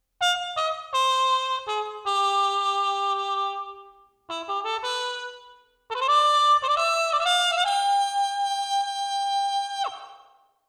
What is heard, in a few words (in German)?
Trompete